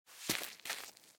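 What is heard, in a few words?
gravel foot movement; A Western Drama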